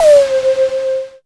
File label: TD TOM; Back In Time Records; Big Bang